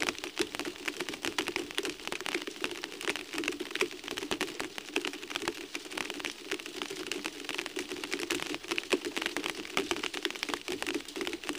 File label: Rain Light Plastic, light; Rain, Light, onto Plastic